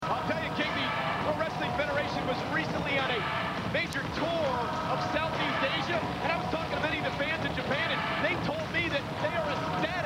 ecstatic japanese fans

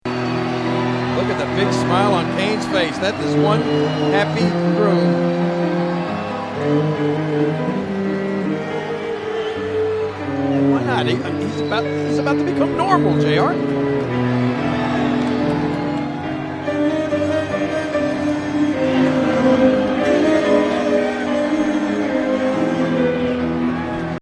orchestral; kane

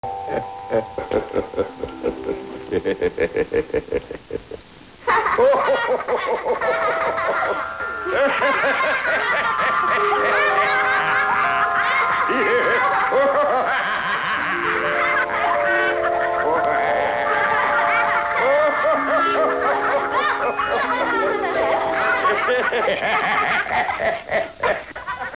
laughing; santa versus martians

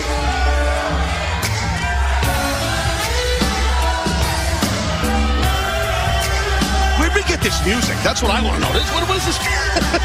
music; Daffney stripping at the speed of a tectonic plate movement while the most bizarre stipper music ever plays; TNA

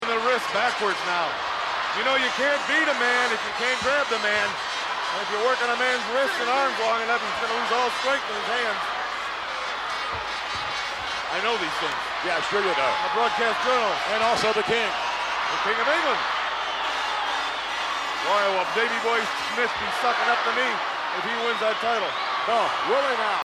noisemakers; seth rollins dolph ziggler iron man; those infernal noisemakers

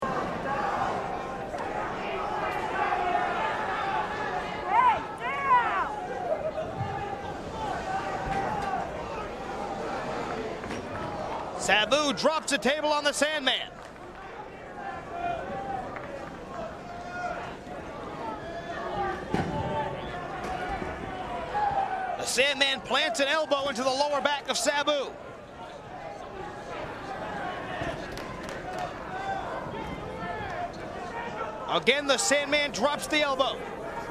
crowd noise

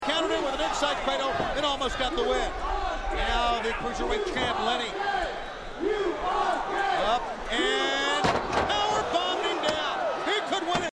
you are gay chant

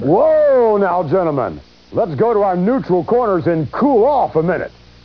whoa; “WHOA NOW, GENTLEMEN!!”; WrestleMania; instant replay

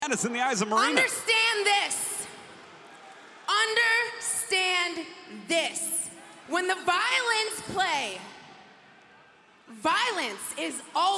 violins; violence

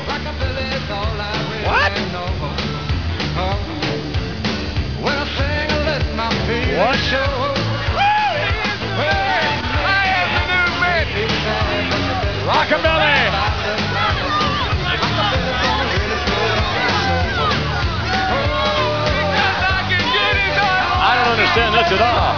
music; rockabilly

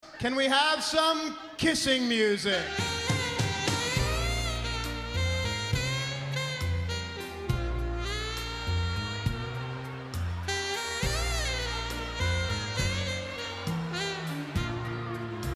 kissing music

